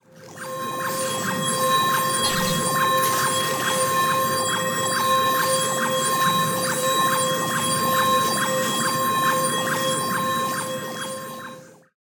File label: Droid Vehicles; Unreal SFX; Battle Droid